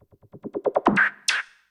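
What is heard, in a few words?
PERCUSSION ELECTRO 140, Techno Drum; Techno2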